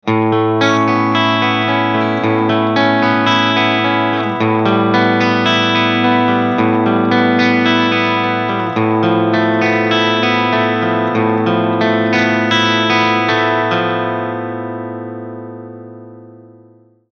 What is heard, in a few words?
BRIDGE, eclipse active krank